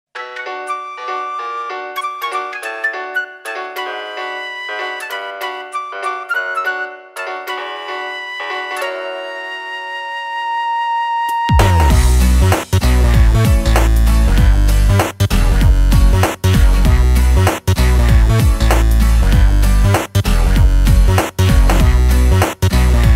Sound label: 8 bit music